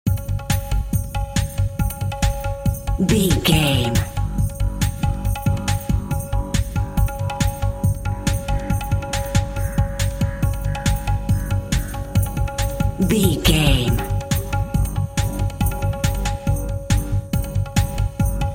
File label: egyptian video game level narrative